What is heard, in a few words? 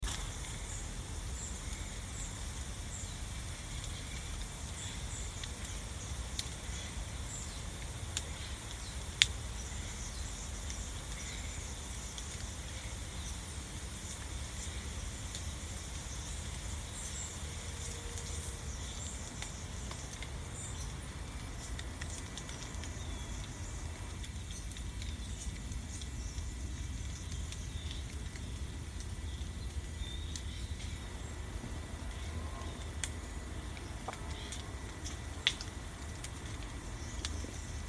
fog ambience